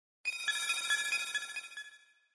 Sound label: moods dazed